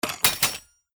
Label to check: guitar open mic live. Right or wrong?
Wrong